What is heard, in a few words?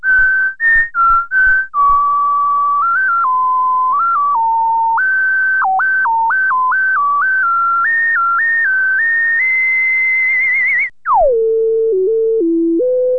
flute; nebulus